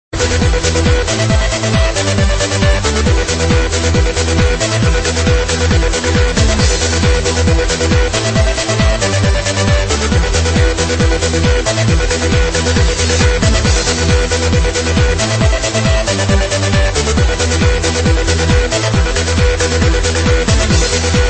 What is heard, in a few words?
techno rave ringtone